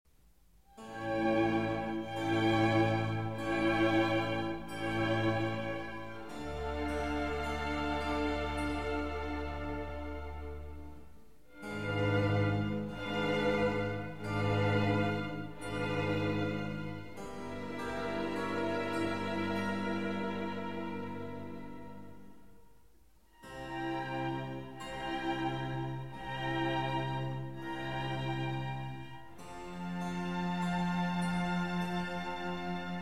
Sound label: Concerto for 2 Trumpets, Strings and Continuo in C